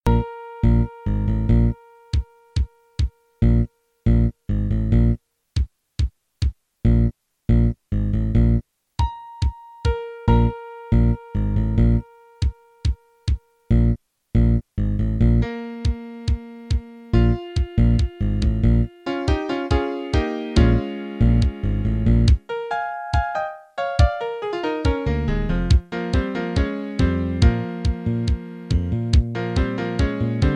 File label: Gospel jazz